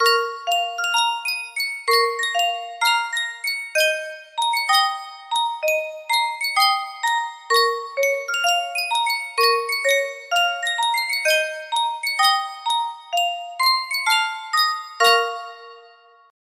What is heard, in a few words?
music box; yunsheng custom tune